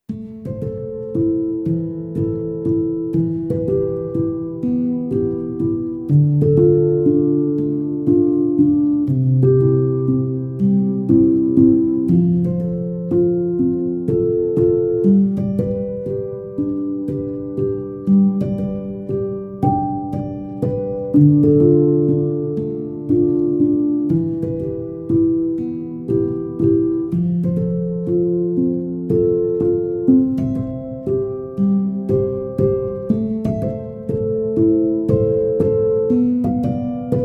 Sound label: Instrumental; Dinger Creek; Timothy Lake